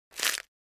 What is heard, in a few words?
Body part or joint breaking